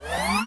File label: startup